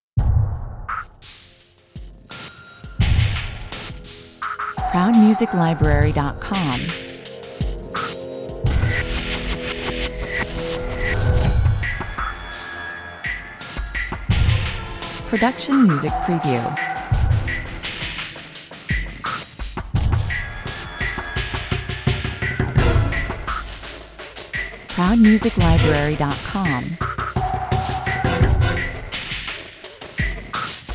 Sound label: 85 bpm, Scary Suspenseful percussion with sound FX.